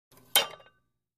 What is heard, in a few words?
Free Weights Exerci